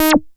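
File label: SHORT ACID, BASSES; Masterbits - Vintage Voltage; VV BASSES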